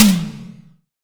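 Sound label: SDS V SNR, snare; Best Service; Real Mega Drums VOL-1; SDSV KIT2 GM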